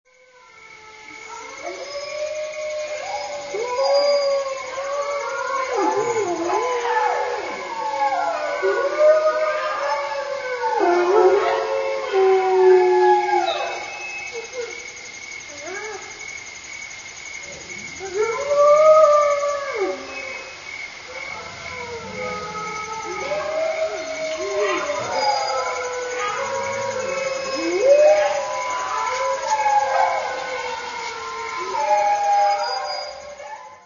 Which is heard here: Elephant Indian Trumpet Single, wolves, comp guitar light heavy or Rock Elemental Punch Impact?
wolves